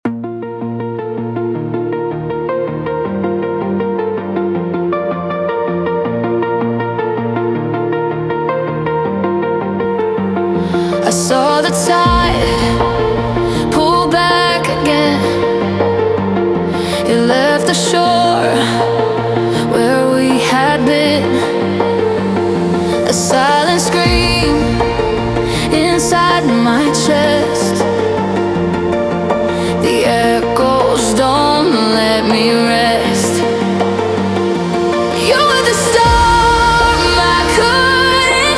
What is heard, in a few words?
Future Bass